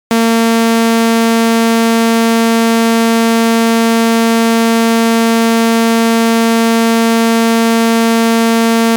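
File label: Oscillator Saw 440hz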